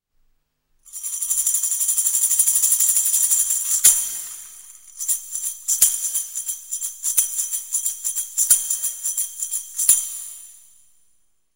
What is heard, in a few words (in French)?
Tambourin, Un tambourin